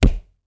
Battle Stone hit; Hilzartov; Fairy Size Doesn't Matter

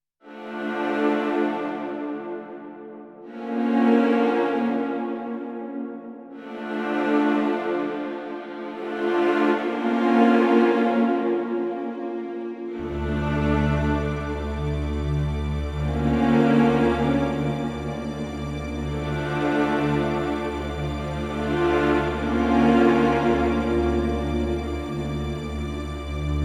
Bad News Ahead Strings

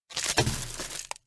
Object Drop

